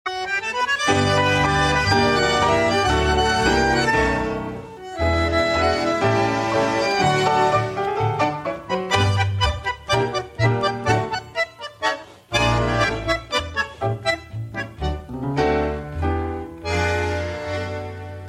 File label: Tango